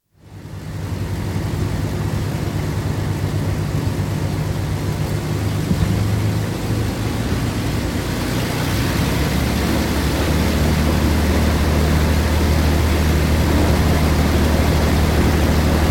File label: Avro Lancaster navigator's position, taxi, shutdown (World War II bomber, 4 piston engines); Airplane Sound Effects; BBC